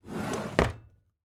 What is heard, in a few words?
Drawer Close; HauntedBloodlines; SFX Producer